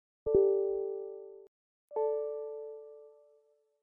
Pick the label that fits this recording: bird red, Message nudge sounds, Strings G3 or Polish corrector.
Message nudge sounds